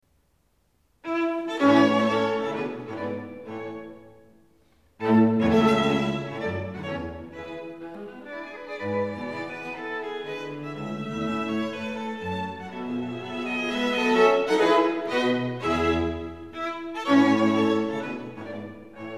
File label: String Quartet in A minor, Alla marcia, assai vivace; String Quartet No.15; Op.132; Ludwig van Beethoven